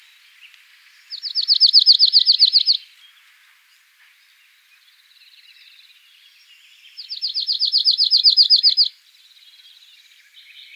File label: call, cirl bunting, Emberiza cirlus, Background: Nightingale song; data from 1 bird